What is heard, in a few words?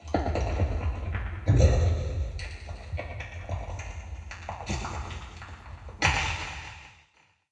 alien, tomb; Zaero